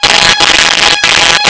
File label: Strings